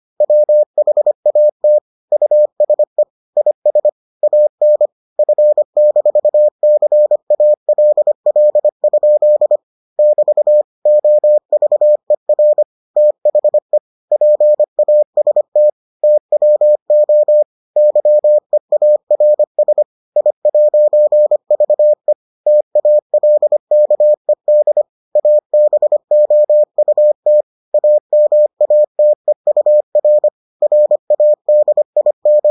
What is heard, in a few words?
morse